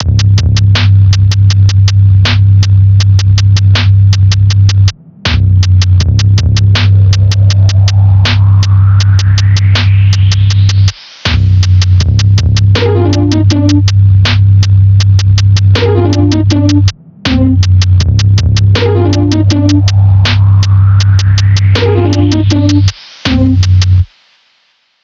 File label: Pizzicato; per sonare